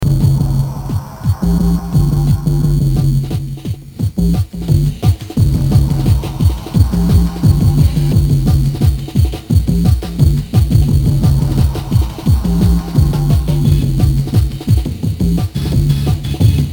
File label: happy breakbeat